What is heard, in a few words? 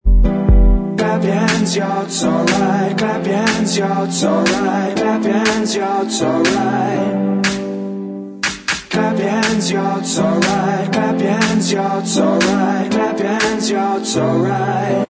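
backing vocals, track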